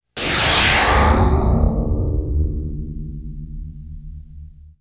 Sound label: Zap Synth, Sci-Fi; Sound Design